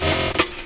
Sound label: Fill, Snare Fills